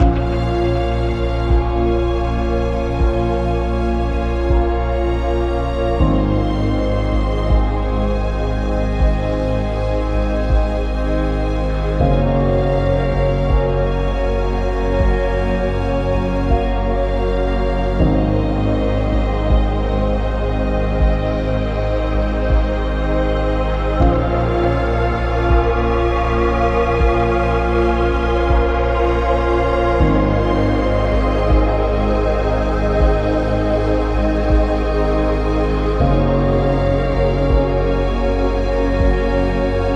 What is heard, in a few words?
Ambient Distant Skies; Ambient Vol 4 Music Pack